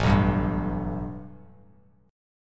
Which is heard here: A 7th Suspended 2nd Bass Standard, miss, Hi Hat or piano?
A 7th Suspended 2nd Bass Standard